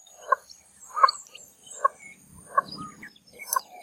Black bellied Malkoha calls Malim Nawar Perak Malaysia